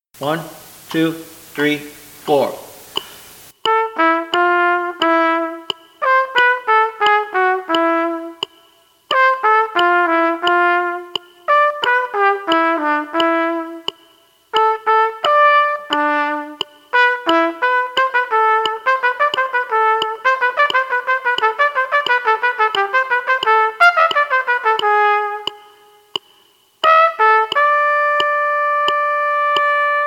Play-along samples, Allegro section, qn=88, trumpet; Cleopatra's Lament